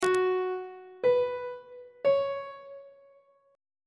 g flat suspended 4th triad chord intervals on treble clef